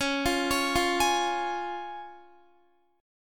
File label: C sharp Major, Guitar; arpeggiated; x,16,17,18,17,16